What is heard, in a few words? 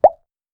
cute dating app notificat